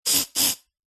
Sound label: household aerosol deodorant spray short